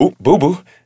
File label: booboo, pain